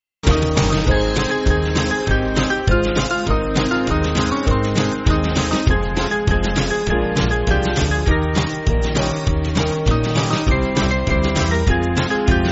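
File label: Band, Music Backing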